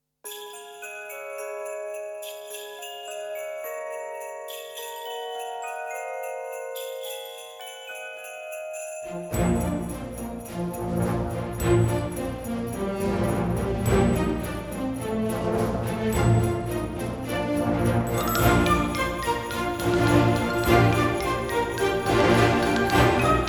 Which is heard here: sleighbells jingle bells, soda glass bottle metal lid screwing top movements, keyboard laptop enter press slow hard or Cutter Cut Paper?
sleighbells jingle bells